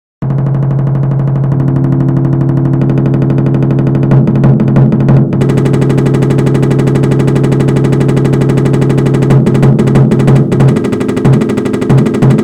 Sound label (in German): hajime drei eins shime zwei 185bpm